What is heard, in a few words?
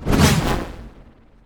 summon items generic, magic